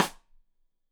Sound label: Side Stick Stereo; Deeply Sampled Drums; SMDrum